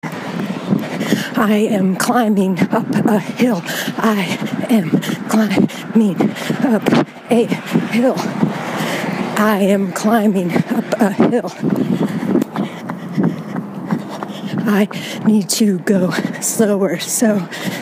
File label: Running Rhythms